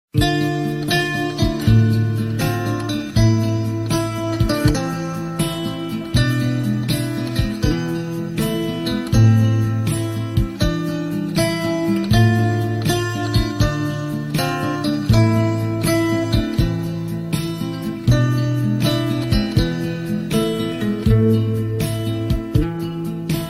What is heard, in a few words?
Guitar Song